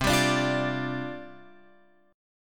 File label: C Suspended 2nd Suspended 4th C Guitar Open F; Csus2sus4 strummed; x,7,7,9,8,9